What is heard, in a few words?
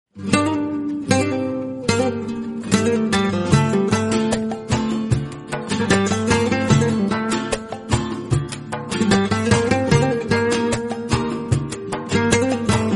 classical guitar music tone